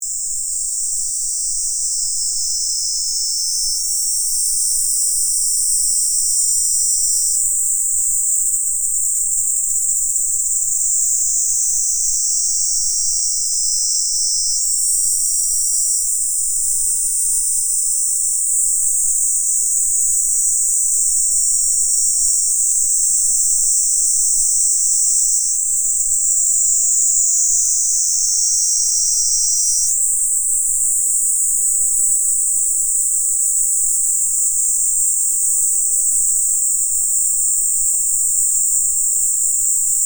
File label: cicadas